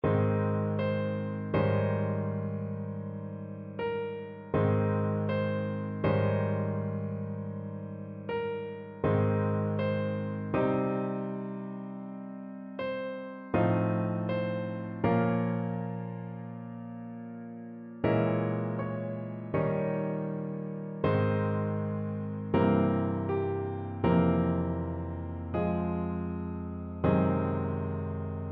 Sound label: Sadness, piano